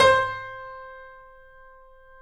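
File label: piano, C, left; InVision Interactive - The Piano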